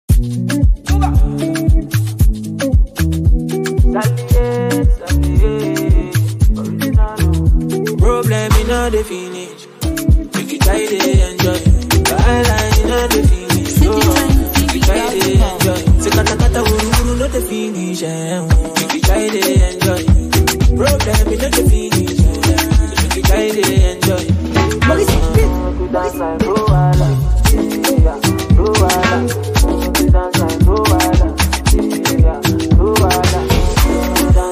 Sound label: Beat, Remix